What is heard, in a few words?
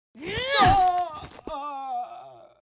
Ball Kick; goddess of trampling